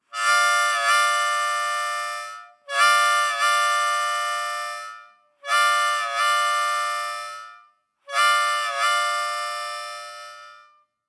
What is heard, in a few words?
Train Whistle Exercise, Hole 4&5, Blues Harp